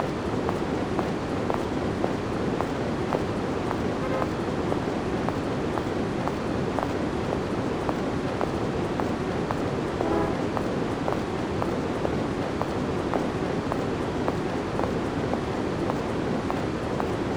TRAFFIC; Kakyuusei; Saturn

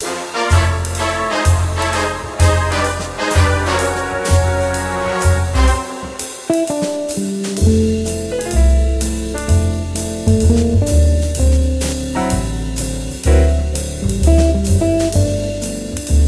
Big Band Music

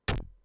Splat!; lemmings ibm pc adlib 1991

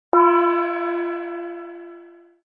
Cymbal

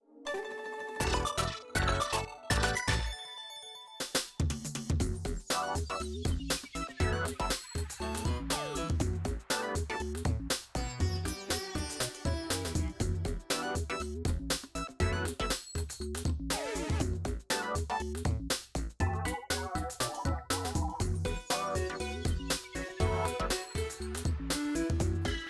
BGM Plaza News

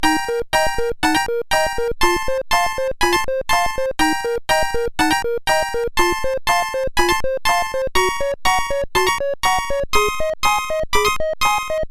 P Switch (Hurry Up!); super mario maker 2; SMB3

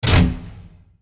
Menu Level Blocked

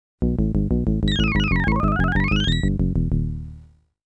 GAME OVER; Legend of Game Music Premium Box; Argus

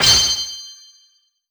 Star Stock; Pac-Man Championship Edition 2 Plus

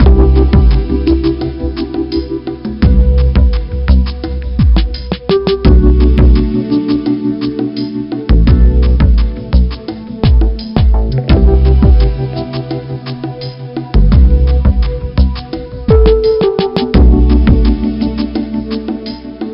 Menu; Scrabble Interactive 2009 Edition; NDS

